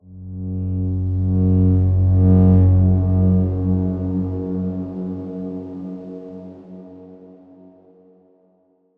Darkswarm F#1 pp; HSS Ableton Pack1

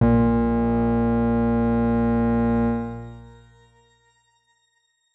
X Grain A#1 pp; healing soundscapes; HSS Ableton Pack1

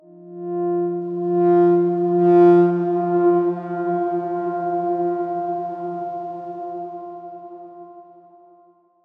Darkswarm F3 mf; HSS Ableton Pack1

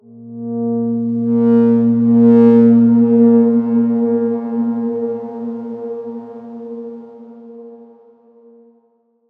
Darkswarm A#2 f; Ableton; healing soundscapes

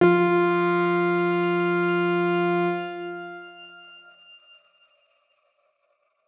Grain F3 mf; healing soundscapes; HSS Ableton Pack1; X Grain

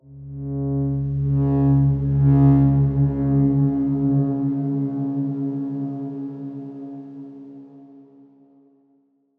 Darkswarm C#2; HSS Ableton Pack1

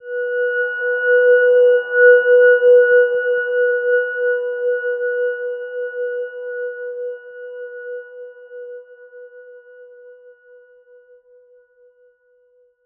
Silver Gem B4 mf; healing soundscapes

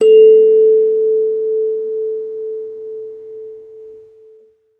kalimba A3 pp; Acoustic

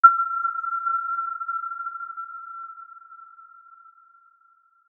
Aurora G6 mf; healing soundscapes; HSS-Custom-1

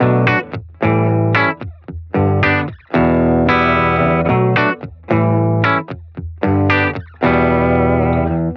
Ska Wave; healing soundscapes

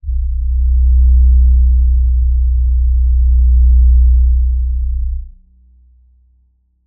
Crystal B1 f; HSS Ableton Pack1

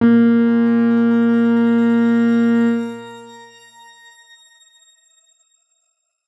X Grain A#2 mf; healing soundscapes; HSS Ableton Pack1

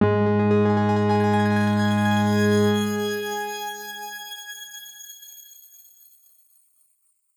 Grain G#2 ff; healing soundscapes; HSS Ableton Pack1; X Grain

